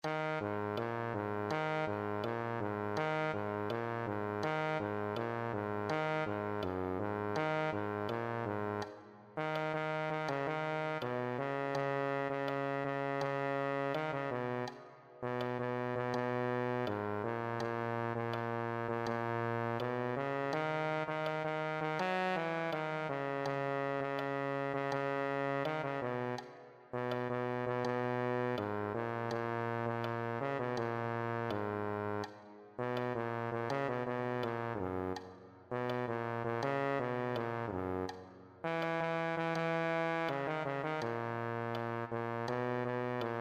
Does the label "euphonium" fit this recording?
Yes